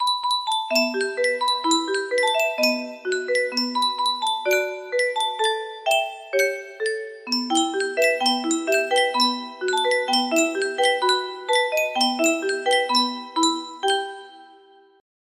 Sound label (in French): Yunsheng boite a musique